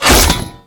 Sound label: blast shield on; user interface